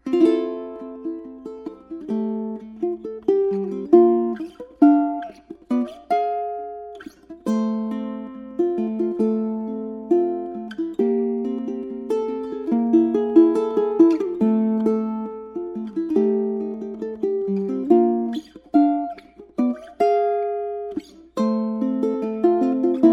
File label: uke suwannee river